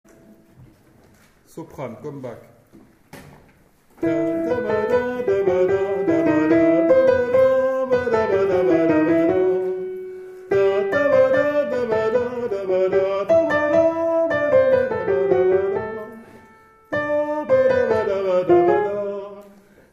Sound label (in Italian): Soprani